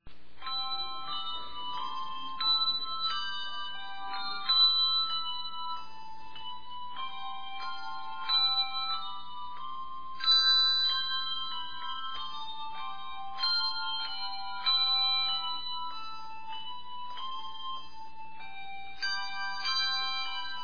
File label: Bell Choir; St Peter Lutheran